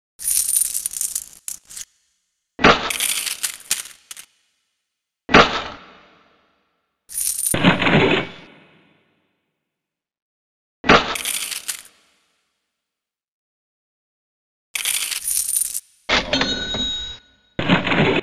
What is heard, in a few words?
Coins Ambience; gba; Spyro Attack of the Rhynocs